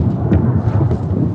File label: Sfx Bowling